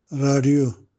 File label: radio